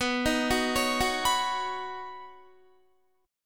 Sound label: B Major Add 9th, Guitar chord; arpeggiated; 18,18,18,18,18,19; 4F,4A,5C,5G,5C,6E; JGuitar